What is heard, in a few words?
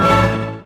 HIT; AKAI S-Series CD-ROM Sound Library VOL-8